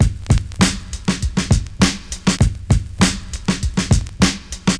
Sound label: Break 100 bpm, drums; Loops; Games You Play; Ableton Live 12 Lite; Core Library